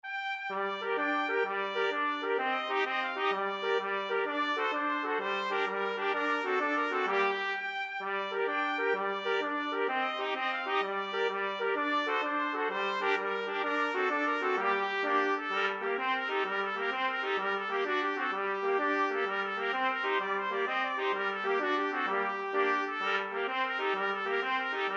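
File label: tarantella, 4 trumpets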